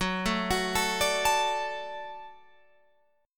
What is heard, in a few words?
Gb Minor Sharp 5th Gb down Guitar Open F; Gbm#5; arpeggiated; JGuitar